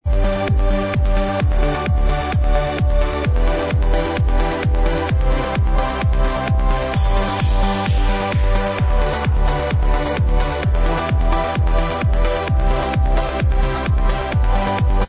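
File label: edm remix instrumental track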